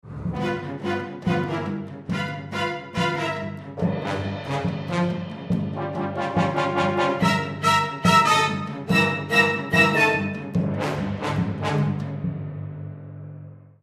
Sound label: Shifting Sands Shovel Cue